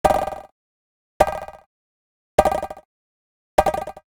Digital Click Neutral

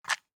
DICE on Wooden Tray, Grab, Standard, 6 Six Dice; Samples; ArticulatedSounds; DICE Sfx Party (Extended)

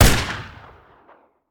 Rifle; Sound Ideas; Guns

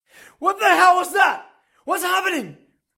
scream what the hell what is happening; RadwinIsland

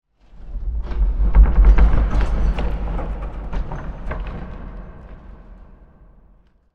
Wood Crackle Big Heavy Movement Reverberant; Hollywood Edge; sound designer's toolkit v2

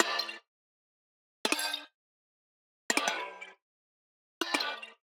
Digital Click Negative

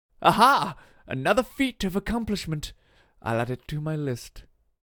Win; Pitch Black Audio Pong

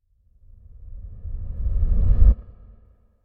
Whoosh Reversed Sub Air; Hollywood Edge; sound designer's toolkit v2

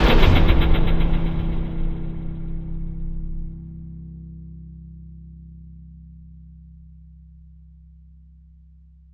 STING, Cinematic Metal Impacts; boom library

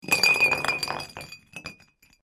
bottle kick; Pitch Black - A Detective's Demise

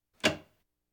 Household Phones

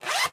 Plastic Zipper Short, Clothing; 1001 Sound Effects

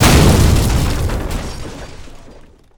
Explo Medium Vehicle; Destruction

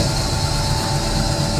ELEVATOR Movement Loop Noisy Smoothed; mono; Universal Sound FX; ELEVATORS & LIFTS

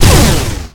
Gun Assault Rifle Power Shot, Weapons; SFX Kit

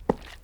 boots wet hard; Apotheorasis

